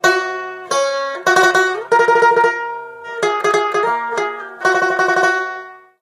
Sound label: Banjo; out from boneville